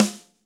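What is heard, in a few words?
SD, snare drum; 90 s Sample CDs; Sound & Vision - Gigapack I CD 1 (Roland); Real-Kit m 7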